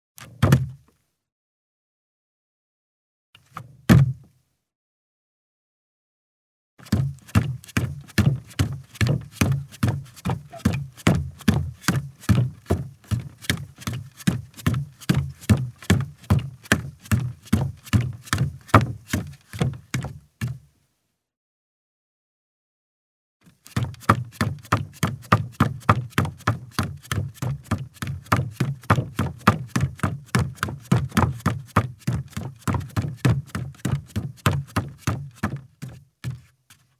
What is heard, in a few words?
footstep; BBC 55 - Footsteps 1